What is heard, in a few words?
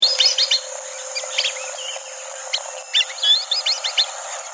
Sirirí Vientre Negro, Dendrocygna autumnalis, Black- Bellied Whistling- Duck; cantos